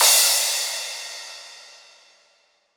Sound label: Crash; Cymatics - Odyssey Crash 4